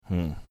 hmm

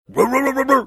barking like aggressive dog

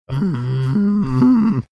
growling; like marge simpson

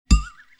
keyboard sound effect

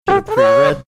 making trumpet sound

